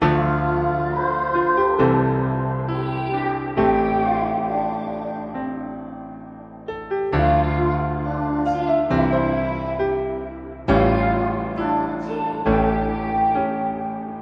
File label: piano, Loops Sad